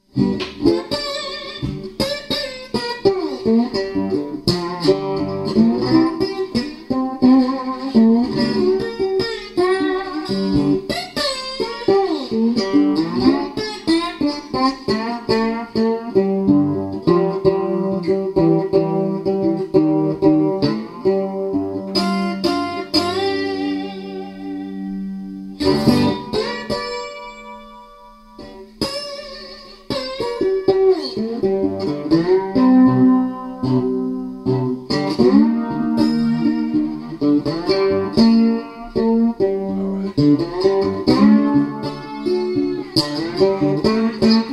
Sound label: jam